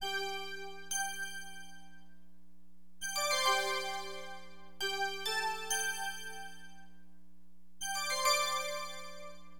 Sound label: BELLS, Keyboards, AcidJazz Funk; LiveAct Pool 1